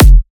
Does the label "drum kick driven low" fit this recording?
Yes